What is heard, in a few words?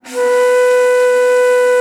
BASS BONS, India Winds